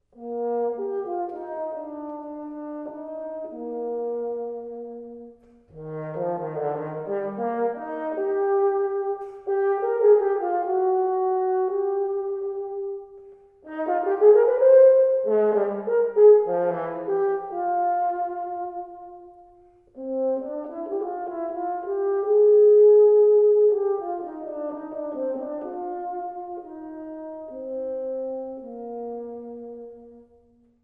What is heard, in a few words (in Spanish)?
trompa